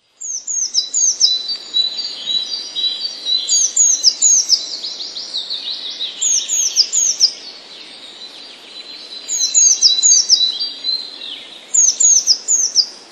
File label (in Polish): modraszka; Modraszka należy do ptaków śpiewających